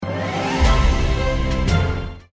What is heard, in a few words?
Ouverture SMS